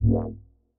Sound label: hum, alerts; gnome